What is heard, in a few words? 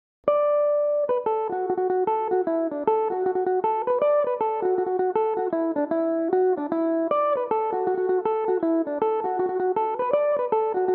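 d major, mandolin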